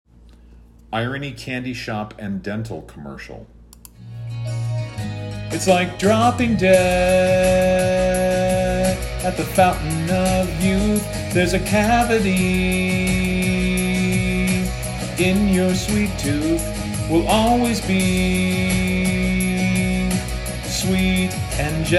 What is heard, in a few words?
Vocal